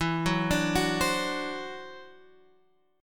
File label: E 7th Suspended 2nd Sharp 5th, E, down, Guitar, Open G